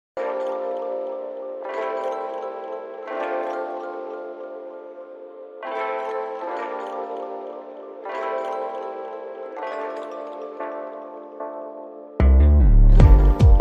Deluxe Keys

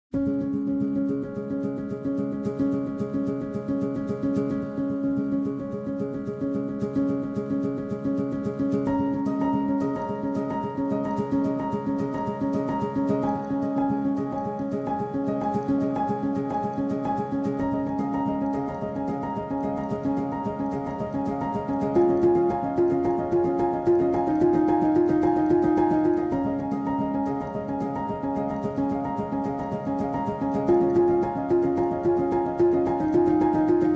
Pianos

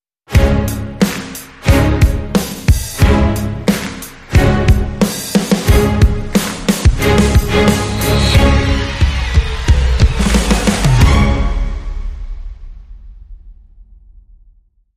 Hybrid Sting

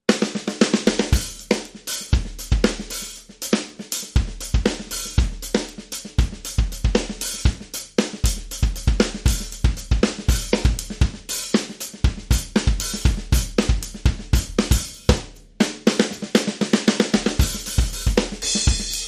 Drums Only

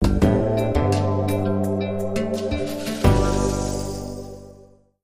London Bridge is Falling Down

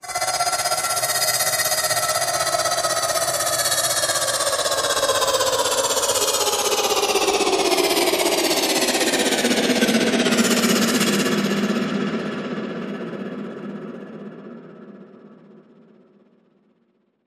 Quantum Skyfall Flutter

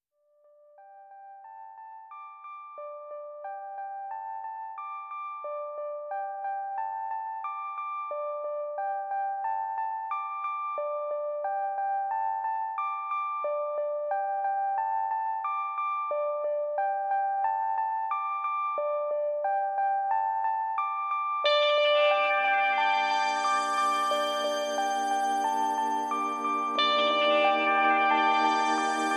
Synths

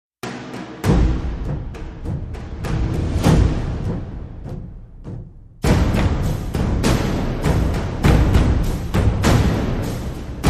Drums Only and No Cymbal

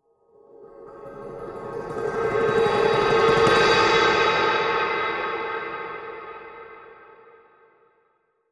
Faint Cymbal Roll

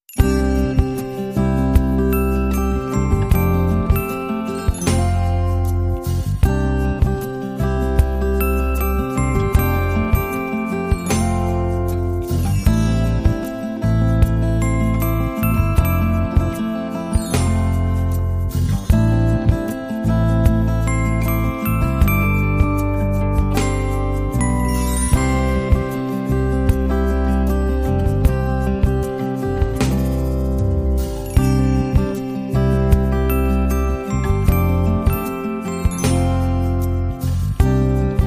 No E Guitar

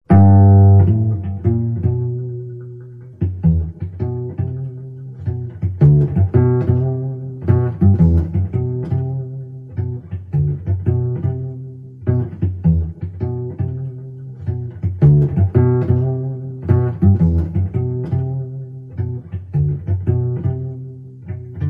Slow Pizzicato Blues

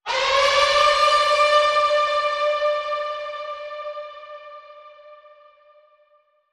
Apocalypse Warning Bend

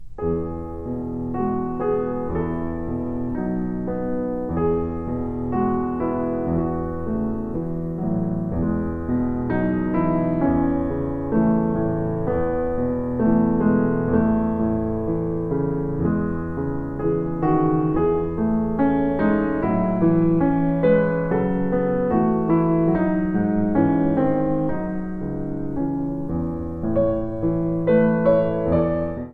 Nocturne

